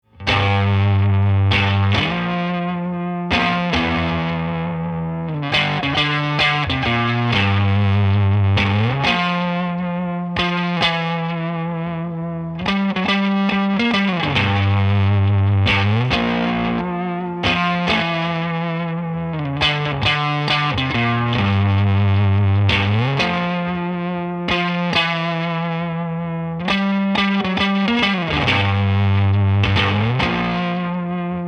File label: Strat neck Tube Drive descending; mercury